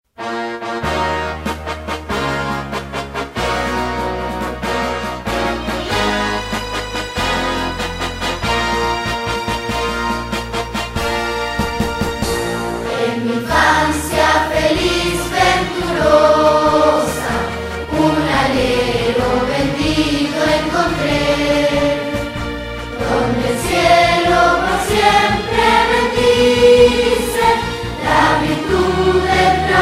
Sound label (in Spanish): himno del colegio